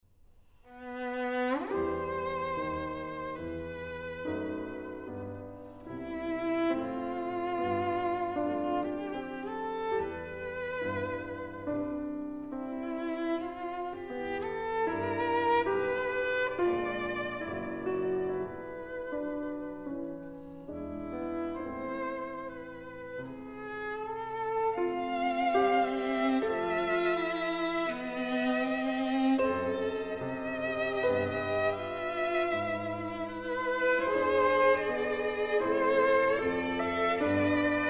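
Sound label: piano trio; d'Indy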